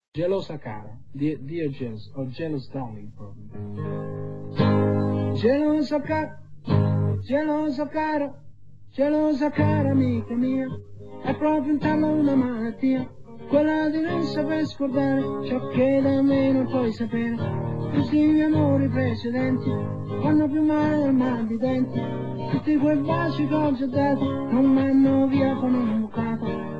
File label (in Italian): live voce e chitarra; inediti